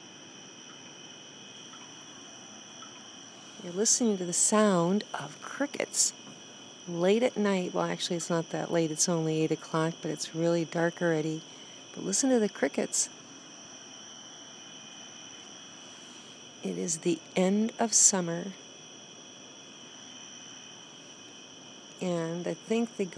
crickets on hot summer night

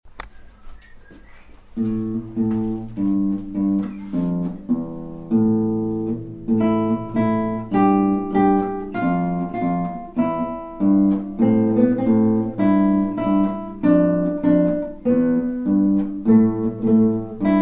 bass

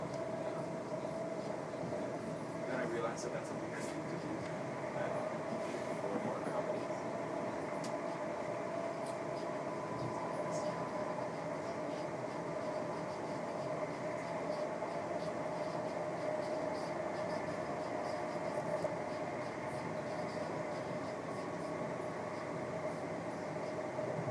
loud headphone music and announcements on train

